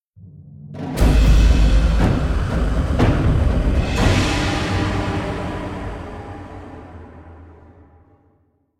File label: String; Assassin's Creed Unity Dead Kings